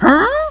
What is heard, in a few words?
HUH